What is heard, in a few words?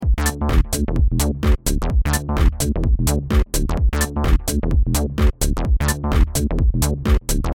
mastering clean; artsacoustic cl series